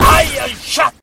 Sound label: golf land shot